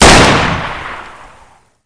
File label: deagle; r bull